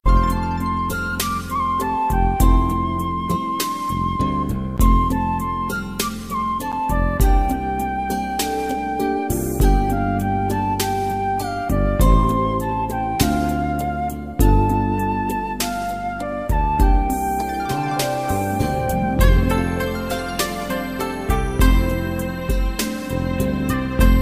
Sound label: game music